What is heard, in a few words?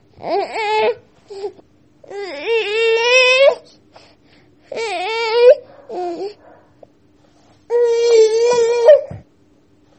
Baby Grizzle